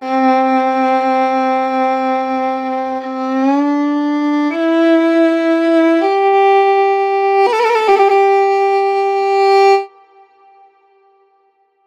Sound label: Oriental Fiddle; сэмплы струнных инструментов; эмбиен